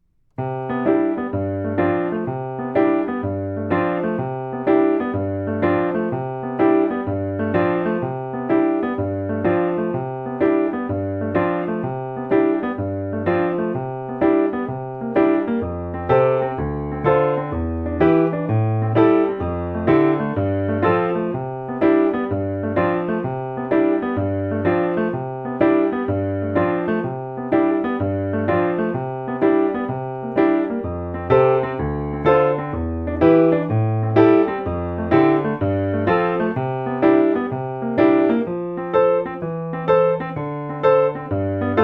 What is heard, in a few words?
piano